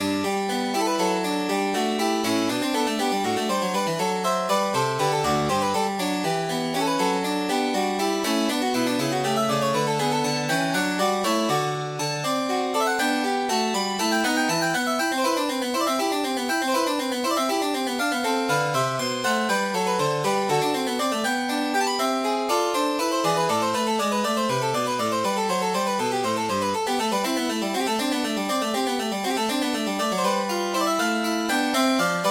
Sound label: Partita, piano